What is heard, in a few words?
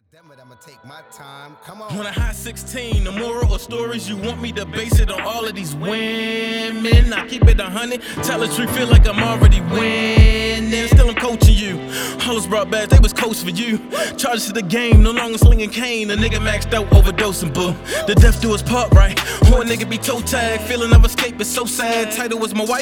Hip hop